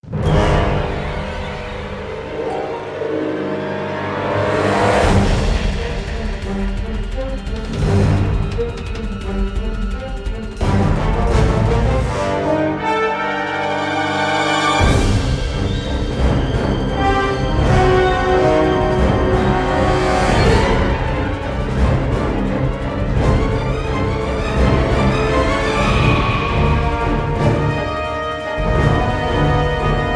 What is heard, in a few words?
music